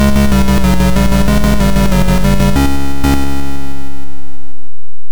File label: Jingle